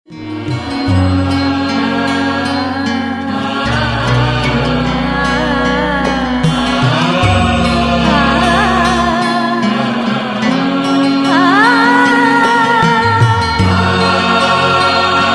BLISS MUSIC

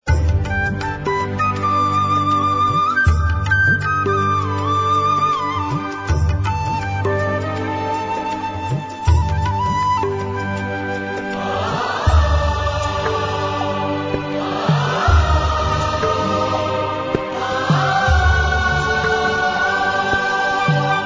music

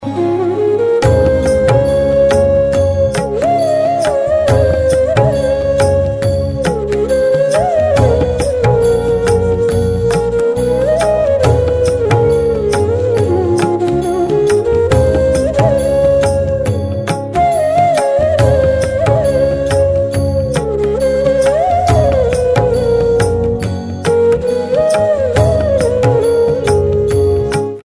music

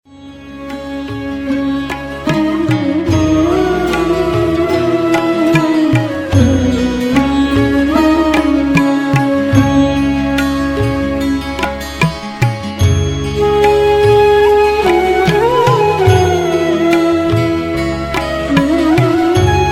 SOLACE MUSIC

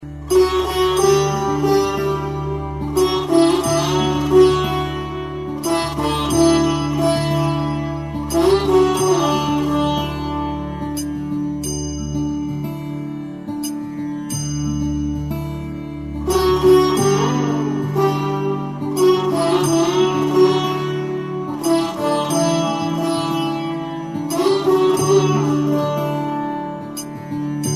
music

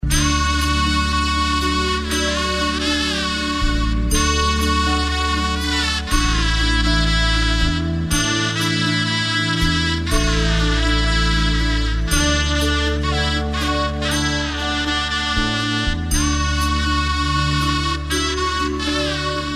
music

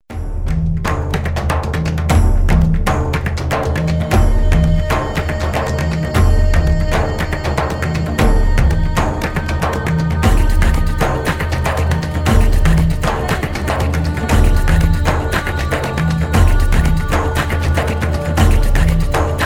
MYSTIC INDIA MUSIC

